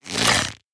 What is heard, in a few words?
Bow Draw Fast